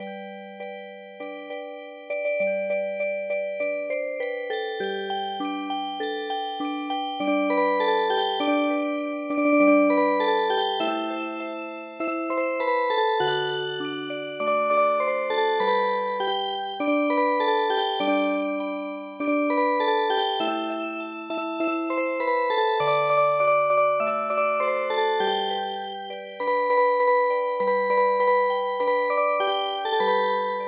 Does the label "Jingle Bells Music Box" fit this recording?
Yes